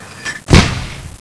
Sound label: mortar load